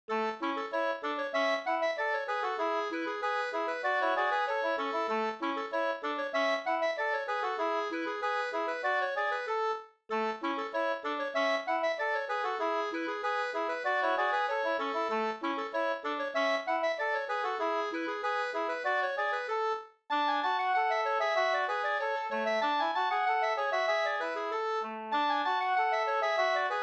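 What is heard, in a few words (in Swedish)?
anglais 2 stämmor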